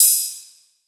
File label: Open Hihat; Pulse; Cymatics; Drum One Shots